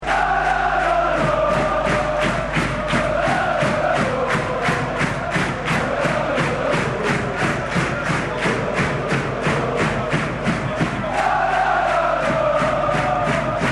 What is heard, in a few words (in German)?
schalala; css; t2d